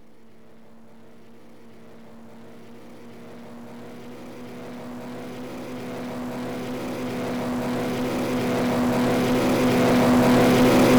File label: R2800 FLYBY P47; engine sounds